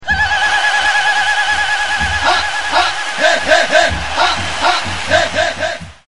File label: Roasting